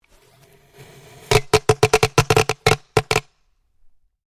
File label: FIREWORKS CRACKER SMALL CLUSTER PLASTIC PIPE; Variety of fireworks being detonated